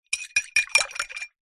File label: food drink metal spoon stir water in drinking glass short